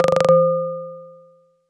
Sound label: Clap C5